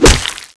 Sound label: tomahawk hit